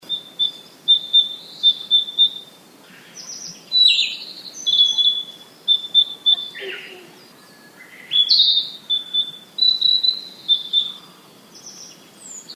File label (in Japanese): kibitaki